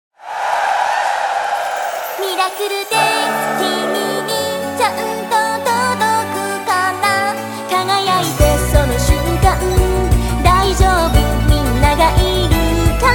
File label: BGM Opening; miracle girls festival ps vita gamerip 2015